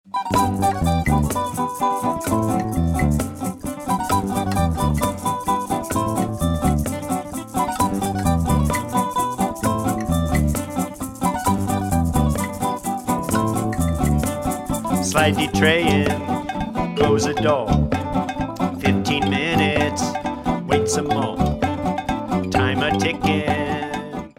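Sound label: jamming with the timer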